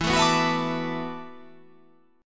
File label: E 9th Suspended 4th E down